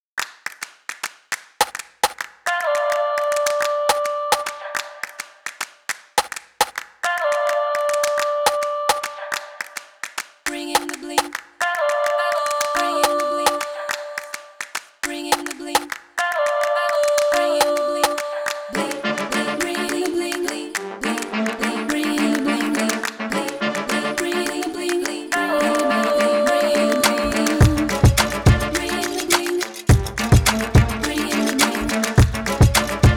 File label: jingle